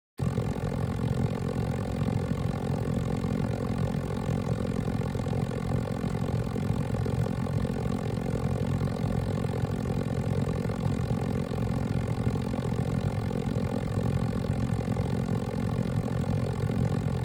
Synthetic Low Rev Engine

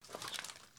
Plate Armor Rustle

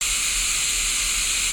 Static Tv Sound

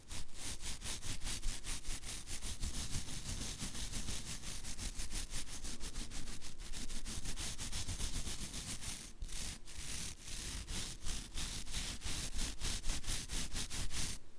Nail Brush

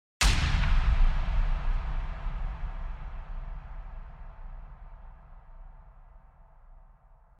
Explosion

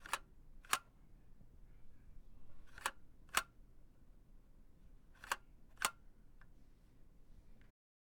Old Camera Click

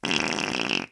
Fart Short

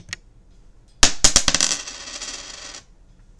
Coin Drop

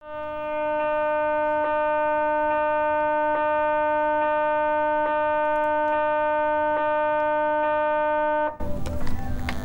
MRI Scanner

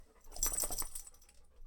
Dog shaking head

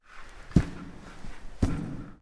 Steps In An Corridor

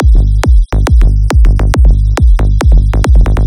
Psy Trance Kick Bass Drum Patterns Loop 138bpm G

